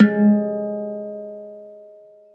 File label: hang